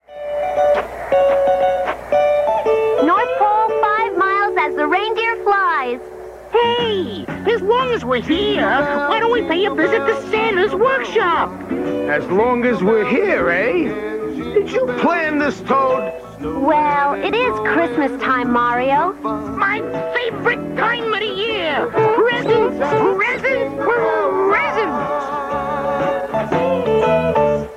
Short music sample of a cover song

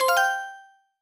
Coin Pop-Up; SM3DL

